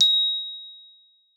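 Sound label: glockenspiel A#4; Xsample VOL-4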